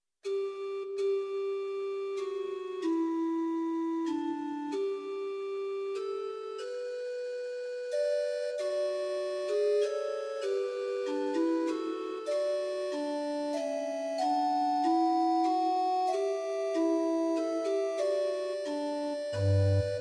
Short Organ Music, Pitch 440, Temperament Werckmeister, RohrF8